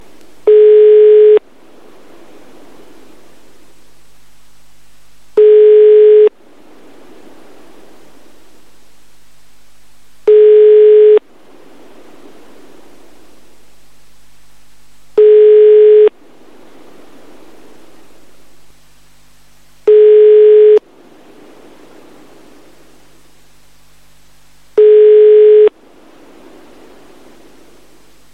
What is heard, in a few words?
Dial Tone